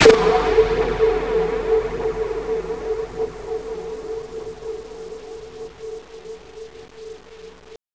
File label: fear music end; cof; slender